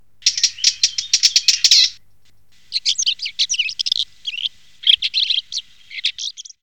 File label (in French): pie-grieche